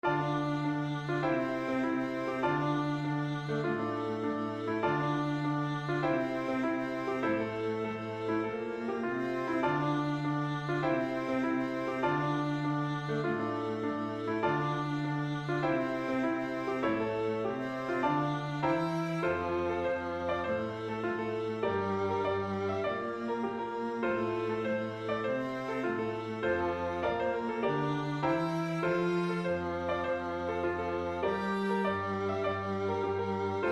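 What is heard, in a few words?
strathspey, melody viola cello; John Manns Strathspey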